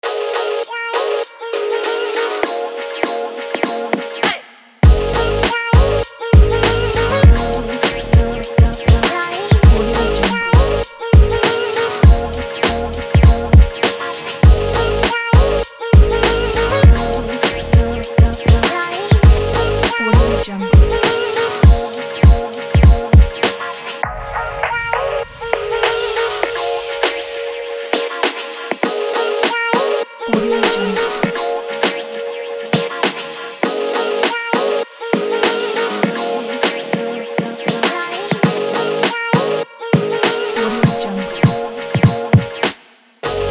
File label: hiphop; phoenix-sound